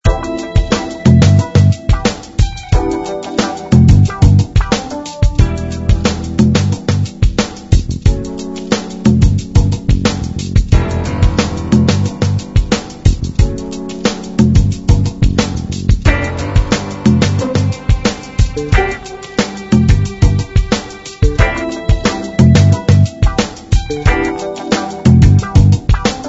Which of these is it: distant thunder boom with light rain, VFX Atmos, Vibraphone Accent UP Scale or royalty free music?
royalty free music